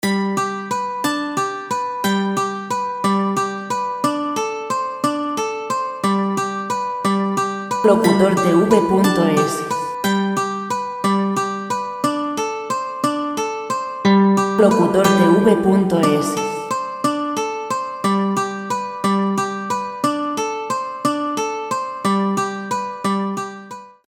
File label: fandango spanish 100 clasica folk; Música de fondo sin derechos de autor para documentales